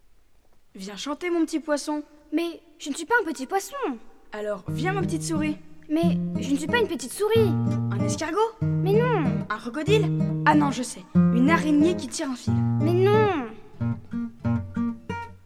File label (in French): voix guitare